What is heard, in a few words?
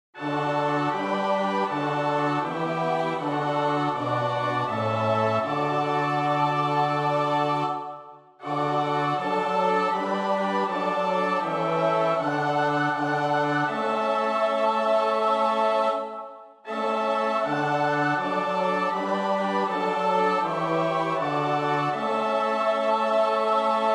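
soprano alto tenor bass; Bach (Johann Sebastiaan); BWV 218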